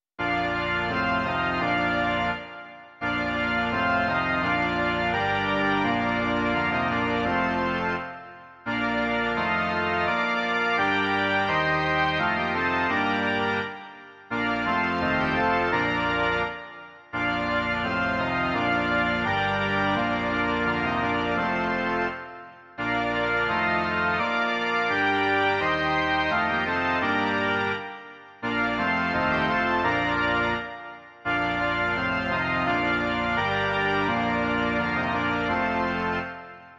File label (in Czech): varhany; Malé kapky vody